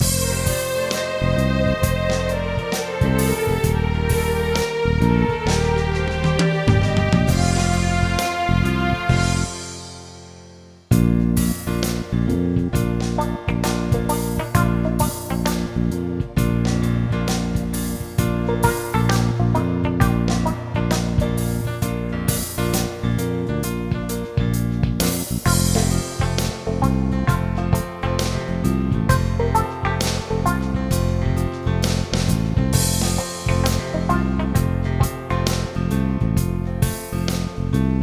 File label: Karaoke